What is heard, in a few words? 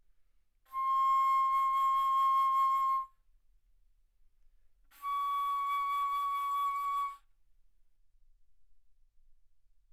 Bass Flute C6 Db6; ff